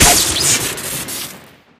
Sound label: syringe overdose crit; medic; tf